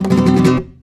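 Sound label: RASGAND C# minor, FLAMENCO Guitars; Spectrasonics; Hans Zimmer Guitars VOL-2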